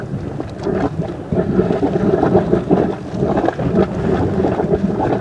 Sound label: lava spout; The Elder Scrolls III - Morrowind; Morrowind GOTY GOG